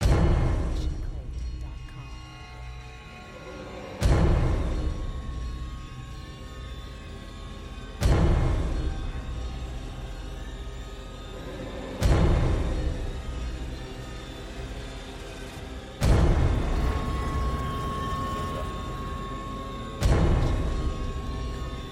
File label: thrilling trailer riser; soundscrate